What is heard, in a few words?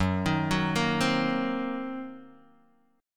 Fsharp 6th Flat 5th down Guitar DADGAD